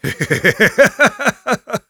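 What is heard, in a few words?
laugh evil, German; Sniper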